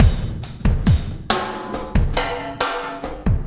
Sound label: Landing Party Beat, Processed Drumkits